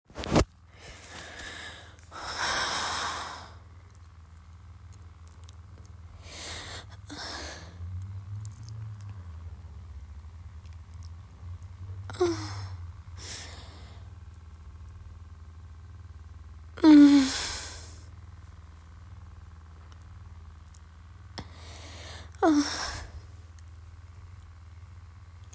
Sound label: F4M Fireworks in the background, ramblefap; no story; Fireworks for followers